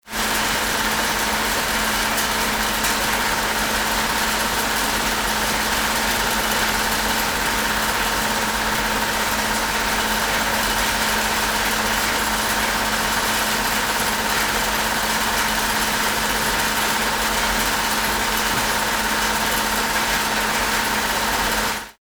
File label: winding machine